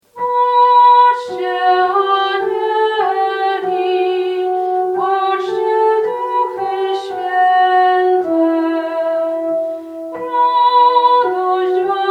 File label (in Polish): soprany